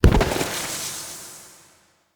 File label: Staff Caster Proj Destroy, Weapons; Player; Staves; Galene